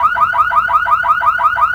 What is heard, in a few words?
car alarm loop